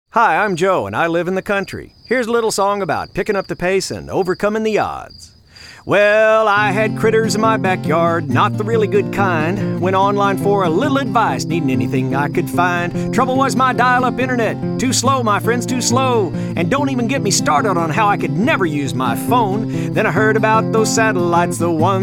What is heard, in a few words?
Critter Song